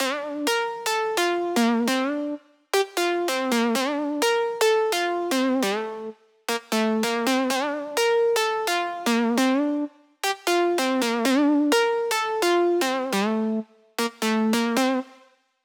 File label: 128 BPM Bb Maj Lead; House; Drop Loops; Cymatics; Butter